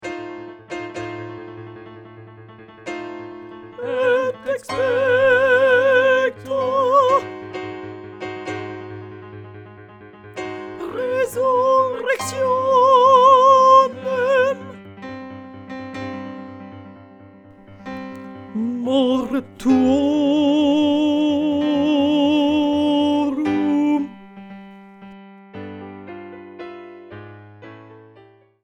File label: alto